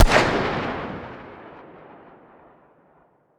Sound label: fire dist, m16 weapons; Urban-Coalition; Decay; arccw ud